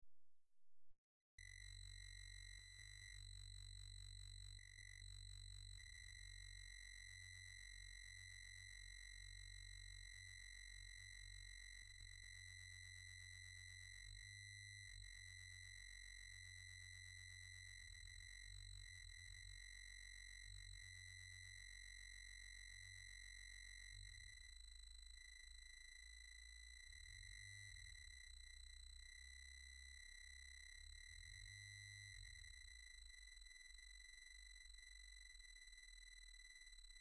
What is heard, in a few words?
lullaby